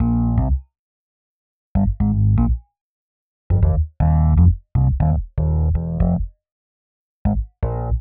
Rhodes Bass C; PowerFX - Nu Jazz House

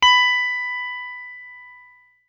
BLUE POP, Guitar & Bass

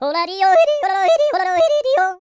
FAST YO, World Voices; ILIO; Vocal Planet

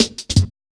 Skippy Cut, Drum Loops, Jungle; Zero-G Jungle Warfare 1, 2 & 3; Skippy A 165.5

